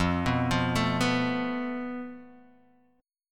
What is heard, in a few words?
F Major Flat 5th Guitar Open D, chord; 3,2,3,3,2,x; down; chord shape